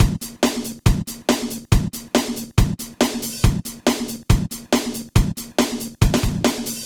Loops BB 140, BreakBeats; Vengeance Samples

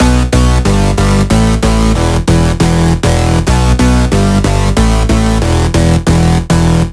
Root B 138 BPM; Vengeance Samples; Special Sounds

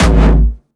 A1, Jumpstyle Kick; vengeance essential club sounds-3; VEC3 Bassdrums

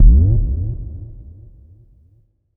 FX Impact; Vengeance Samples

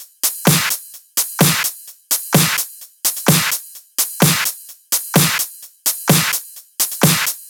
128BPM Drums; Vengeance Samples; Weekend Kit

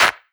Percussive, Percussion; Vengeance Samples

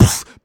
Beatbox Snare, Human Beatbox

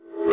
tom fx REV; DnB; Breaks N Beats Sound Kit; DnB Kit 1

